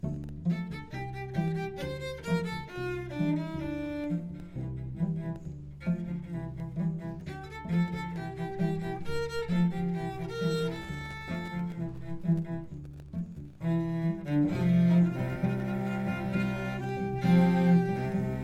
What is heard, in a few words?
Cello Ensemble